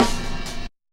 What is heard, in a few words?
Creep Snare